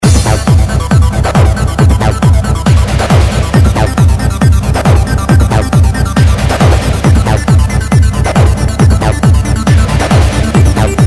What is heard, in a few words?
Big Trance Mix